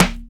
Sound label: focused steel snare drum sound a sharp key